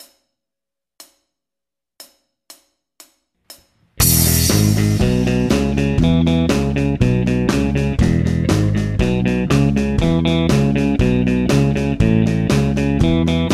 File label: fast walking blues band track